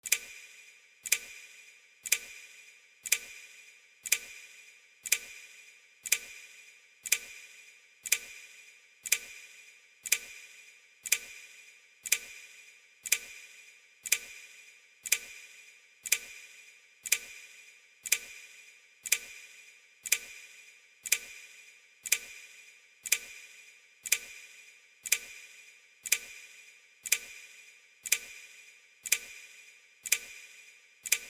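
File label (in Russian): zvuki jeho, echo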